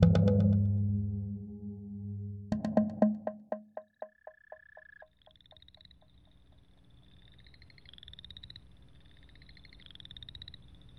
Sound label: Woodland